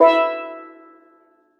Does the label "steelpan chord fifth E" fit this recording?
Yes